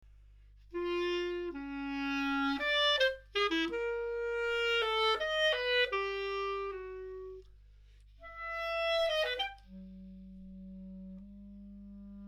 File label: bipolar, clarinet